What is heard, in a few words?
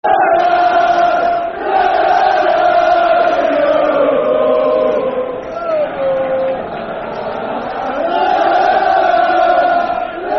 La, La, La Lalalaaaa.... Yeovil, Football Chant; Yeovil Town Ytfc; fanchants